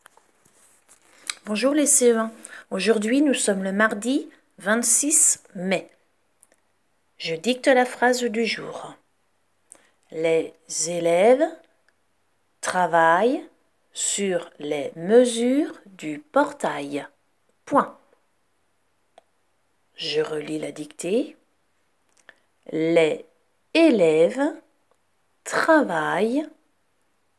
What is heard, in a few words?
Vocal